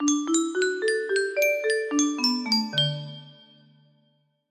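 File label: christmas song, music box melody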